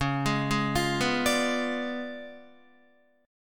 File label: Db 7th Flat 9th, Guitar; arpeggiated; JGuitar